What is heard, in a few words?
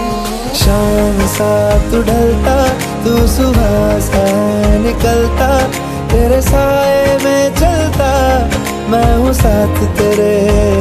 Romantic Hindi Ringtone